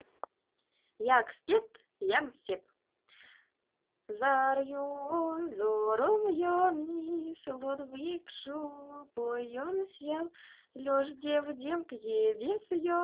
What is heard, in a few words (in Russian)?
песня наоборот